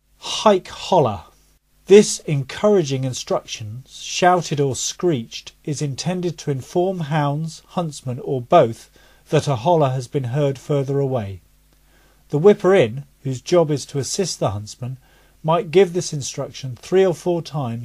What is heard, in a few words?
"huic holloa", calls